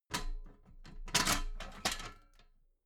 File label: metal ladder small handle unfold soft